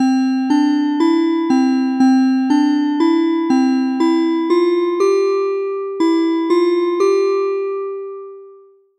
osc triangle

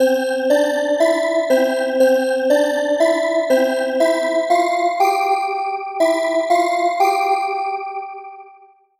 unison high detune

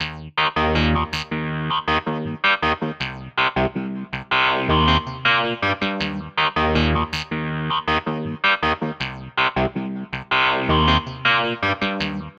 loop with effects

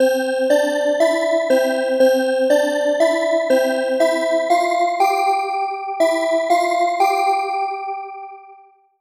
unison detune mix

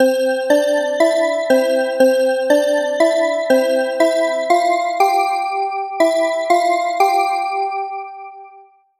unison lock

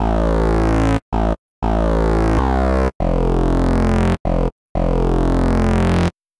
wave loop

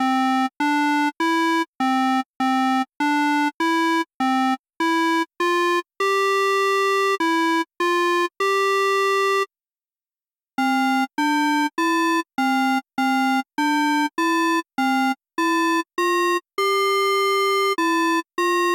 with and without bend

